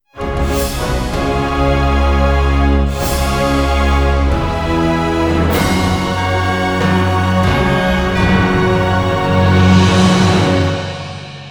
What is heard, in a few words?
Mus Win; Hinterland